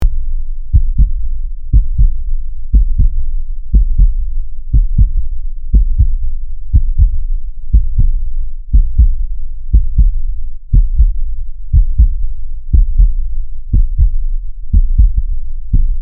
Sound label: heart beat